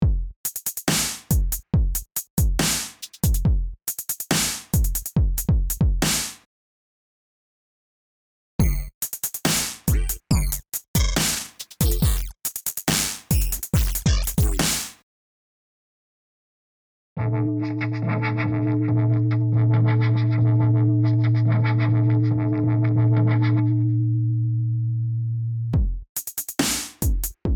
dubstep example

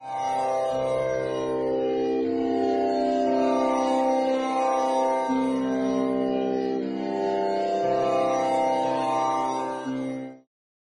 tanpura drone c binaural

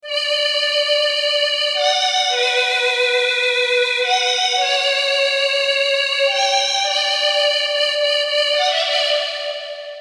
serum synths; chiqui audio

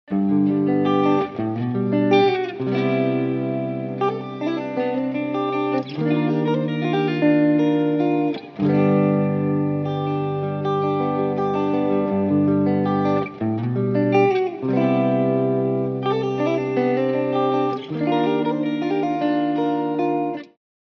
swirling spectrum guitar

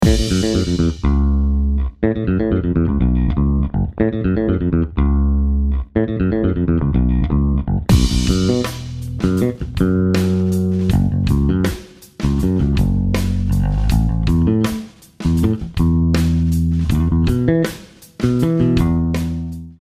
snare buzz dry

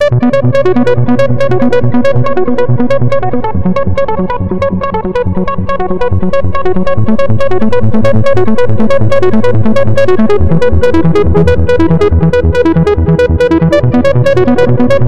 lead spatial echoes

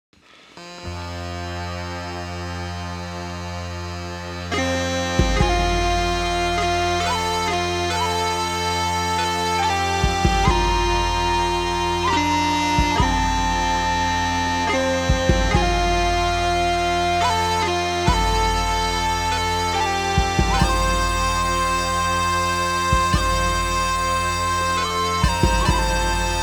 celtic instruments

